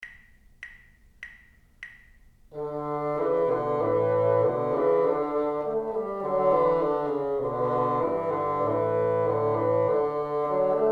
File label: together, bassoon